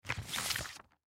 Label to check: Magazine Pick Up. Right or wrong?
Right